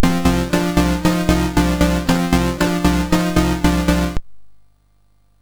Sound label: Fire Breath, MOS6581R3